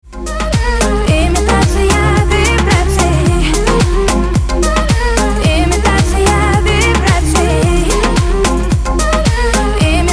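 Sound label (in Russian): imitaciya vibracii, vibration imitation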